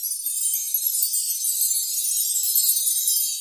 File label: chimes